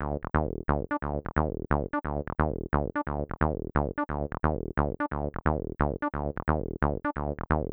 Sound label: Acid, TB-303 bassline; midrange acid line; Roland Cloud BOSS Effects Pedals